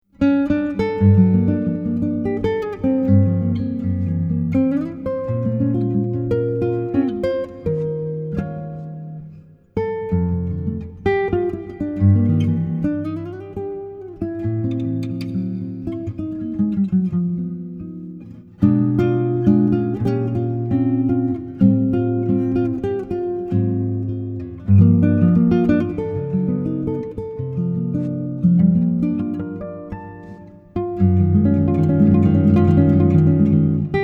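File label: Jazz, classical guitar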